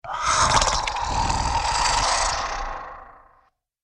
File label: Monster Night Night; Monster Sound Effects; Andrew Fleming